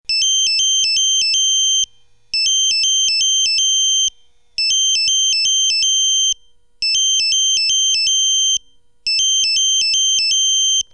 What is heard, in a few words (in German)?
Bereitstellung; Alarmierung